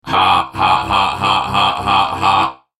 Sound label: Steam Golem laugh